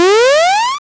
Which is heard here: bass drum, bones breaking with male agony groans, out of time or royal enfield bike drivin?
out of time